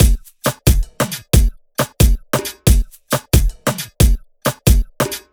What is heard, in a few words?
Loop Cumbia 90bpm; Veguzzi